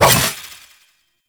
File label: Two Handed Nikana Swing; Warframe; Duviri; Dax